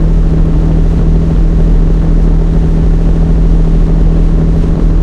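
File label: comet engine interior rpm 33 load, tanks; War Thunder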